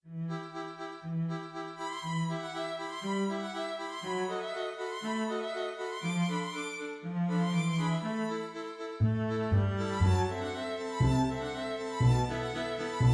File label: for string orchestra